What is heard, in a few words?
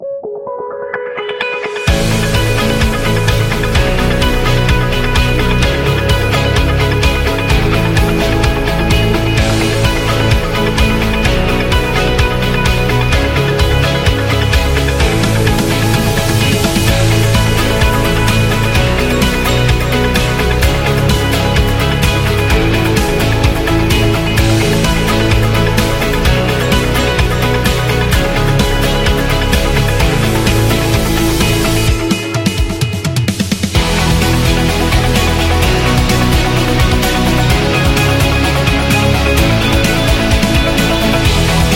Rock; snow